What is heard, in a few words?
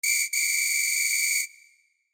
whistle